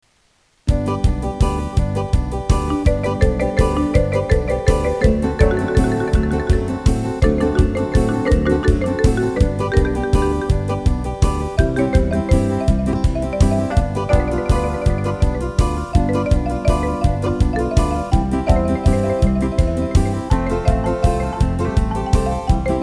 Marimba

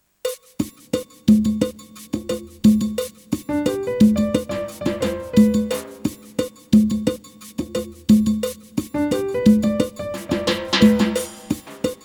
Soft Salsa

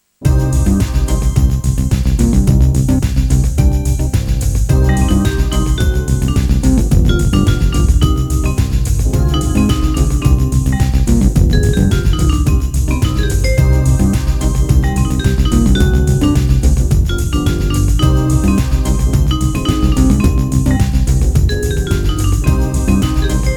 Rumba

